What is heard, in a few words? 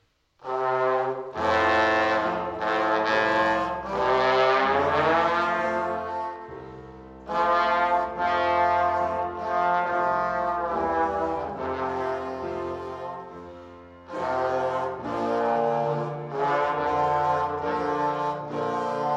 Tromboon